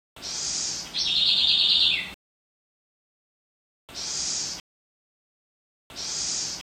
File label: troglodytes, ambience